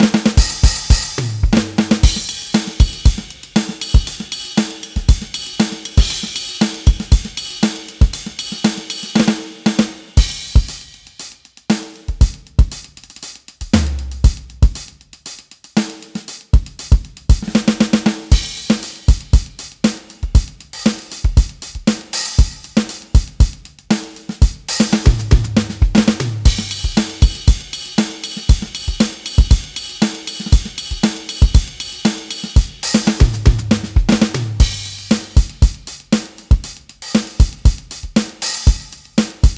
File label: Studio Drum